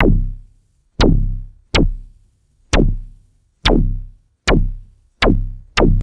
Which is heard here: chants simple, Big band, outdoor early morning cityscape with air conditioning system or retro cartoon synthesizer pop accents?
retro cartoon synthesizer pop accents